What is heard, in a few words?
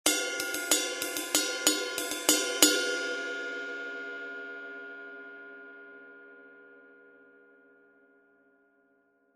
21in Sweet Ride, cymbal